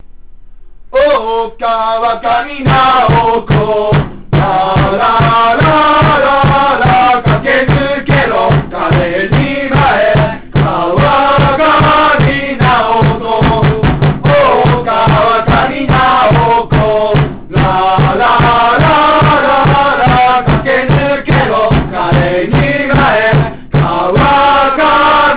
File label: song